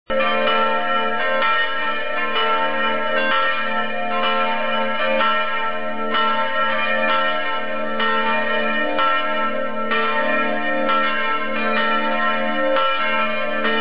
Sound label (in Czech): zvoneni